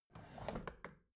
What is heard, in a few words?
bathroom drawer open regular